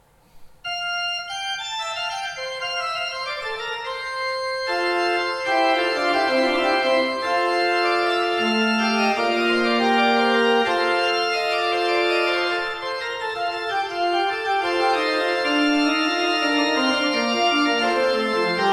Ott, pipeorgans

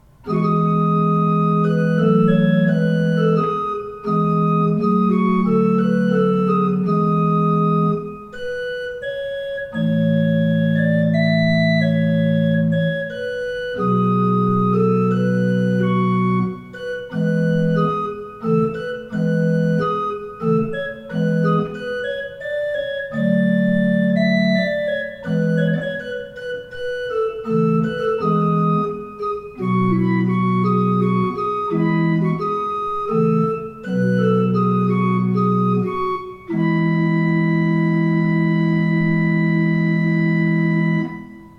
Baltes, pipe organ

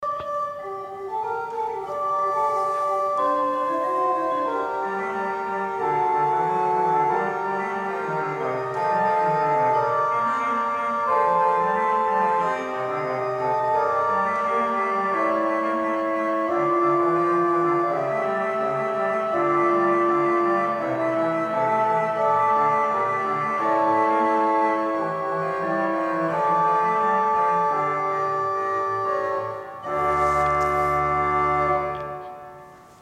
pipe organs

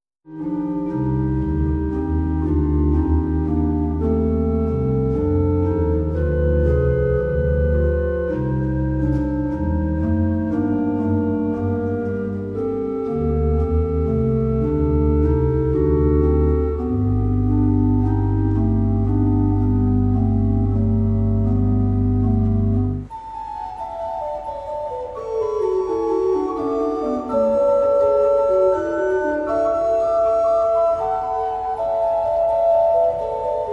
Verschueren, pipe organ

